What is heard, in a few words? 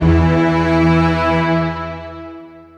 Big strings E2